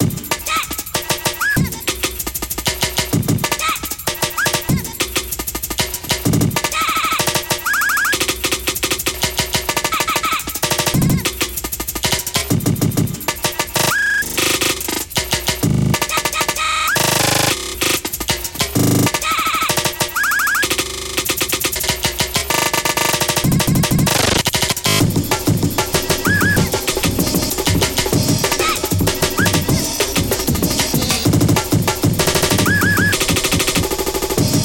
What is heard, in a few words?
BREAK BEAT